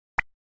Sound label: chain removed; game; guodongrenwu